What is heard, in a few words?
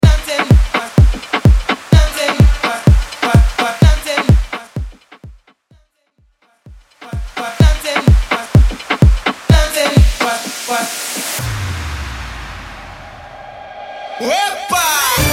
90s Tribal Bootleg Mainstream; Vegas Edit; 126 Bpm